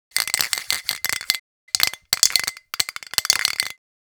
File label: Spray paint can shake